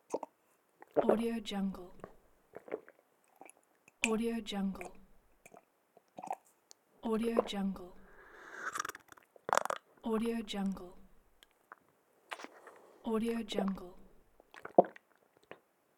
drinking water

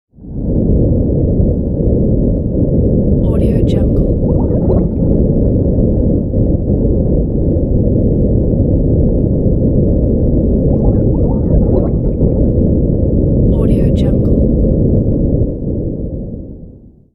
underwater

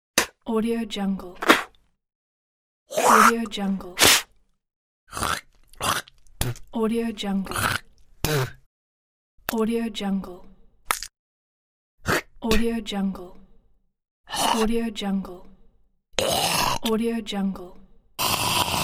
spittle

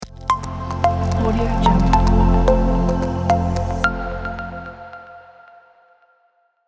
corporate logo

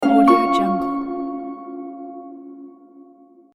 level up

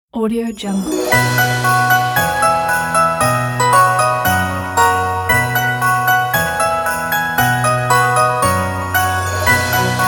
christmas holiday

